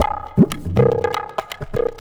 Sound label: Tabla, 120 BPM; Zero-G; Pure Tabla